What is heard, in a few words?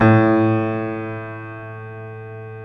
A1, GRAND PIANO; InVision Interactive; Lightware VOL-1; Instruments & Percussions